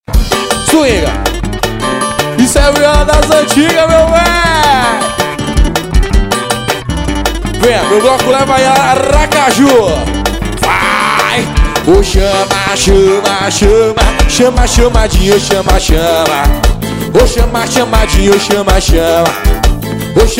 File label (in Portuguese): chamadinha viola 74 viola das antigas